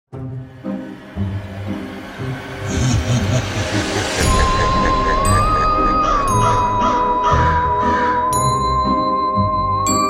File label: Ghost